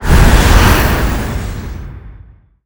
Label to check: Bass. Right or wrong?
Wrong